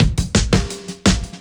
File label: Beat Style, 170bpm, breaks